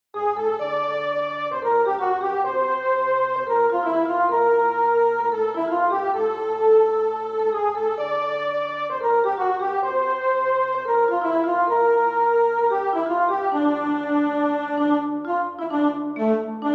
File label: midi